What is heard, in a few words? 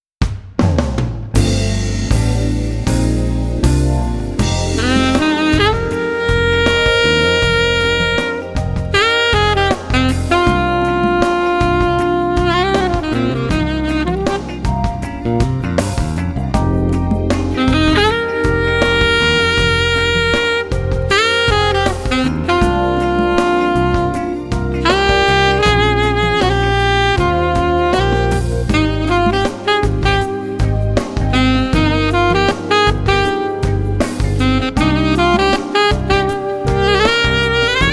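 Alto, sax; Sepia Tone VI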